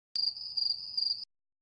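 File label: sounds of a high five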